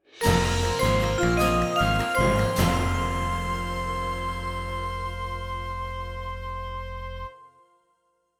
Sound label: Vibes Flute Stinger; Short